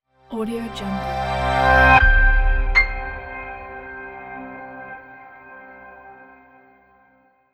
simple intro logo

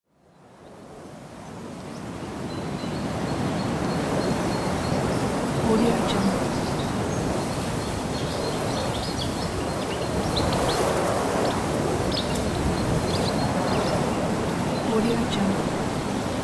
forest wind

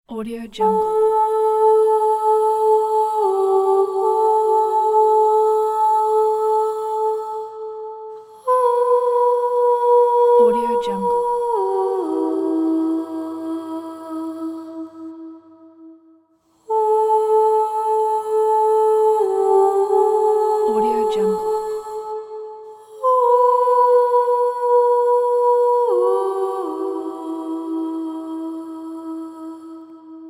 longing mystical chant

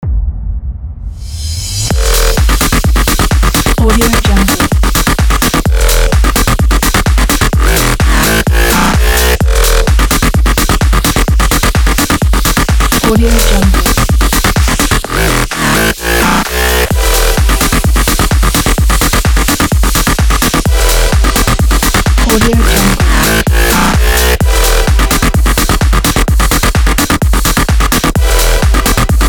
experimental bass music

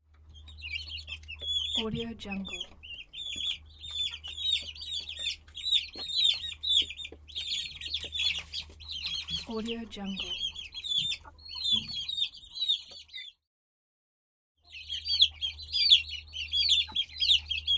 chicken coop

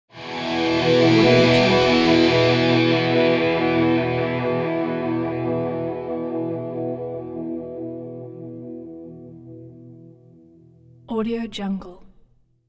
synthesized electric guitar chord

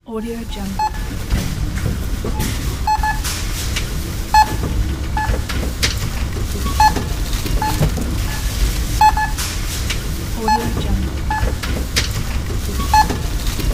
supermarket ambience

